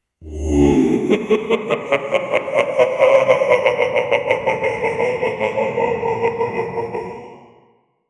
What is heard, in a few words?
demon laughing the voice; small room